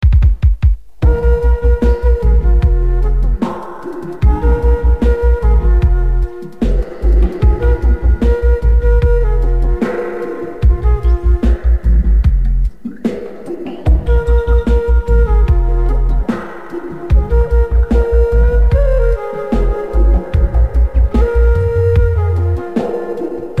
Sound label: Chanting Flute